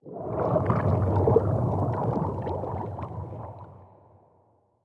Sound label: Underwater Play Underlay